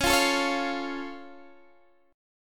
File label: C sharp Augmented, C sharp, down, Guitar, Standard; strummed; JGuitar